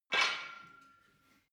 barbell weight rattle, fitnessstudio

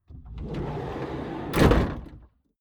van slide door open

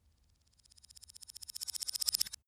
thin metal vibrate reversed long; industrie wirtschaft; metall

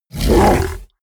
fishman vocal snarl short; kreaturen

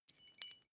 key ring mid size fly tinkle; objekte; schluessel

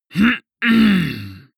male harrumph angry short; koerpergeraeusche; menschen; soundpool

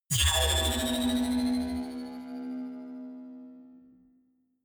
energy field vanish; energie

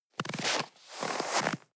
step snow scuff

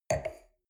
wine glass place table soft short; soundpool

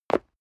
step leather shoes concrete single stair up; schritte

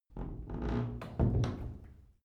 old door close soft

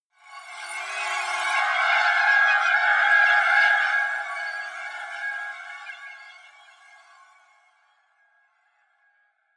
swarm; drones

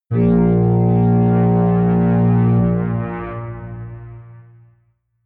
synth tremble bass, drones

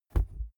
wings flap deep; natur-tiere; voegel

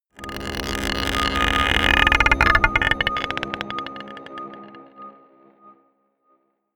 scrapes marble pot dark, drones

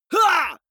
male fight shout; comedy-cartoon; soundpool

